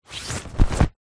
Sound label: foley parachute small deploy open; zapsplat